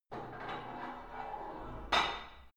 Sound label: put light weight onto barbell soft reverby, fitnessstudio; sport freizeit